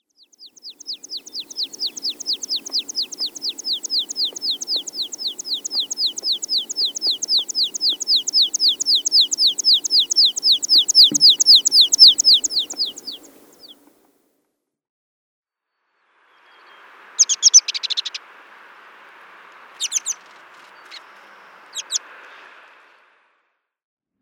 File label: American Pipit